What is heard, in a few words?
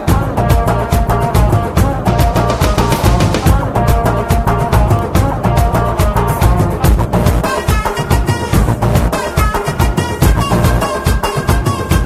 BGM Telugu Ringtone